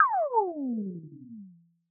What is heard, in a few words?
Laser Charge Down; SoundsCrate